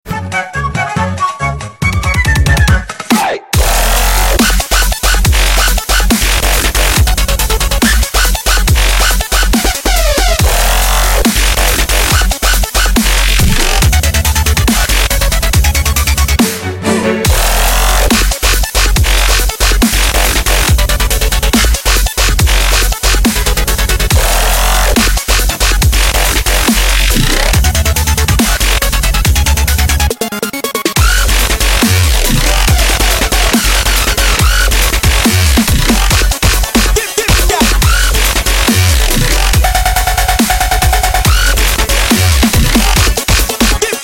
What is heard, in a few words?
dubstep remix; Angry Birds